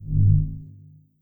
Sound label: Teleport